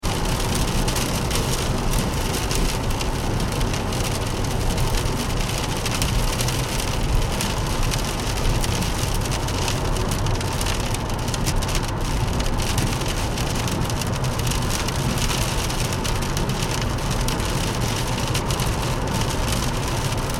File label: transportation car driving 70mph internal rain ford focus; Car Driving 70 Mph In Rain - Internal Recording - Ford Focus; Cars; Vehicles; Car Driving 70Mph In Rain (Internal) 3; McKinney Sound